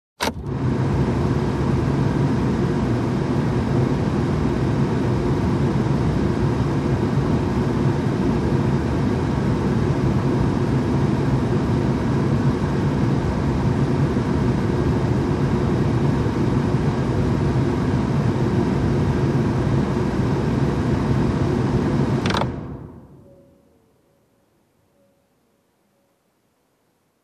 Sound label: Car AC air flow